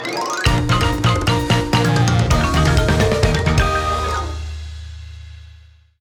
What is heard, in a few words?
Win Jingle